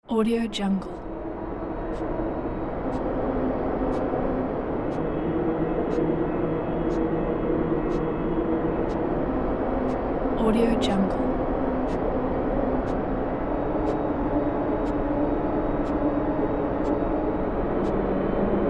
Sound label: ethereal dark ambience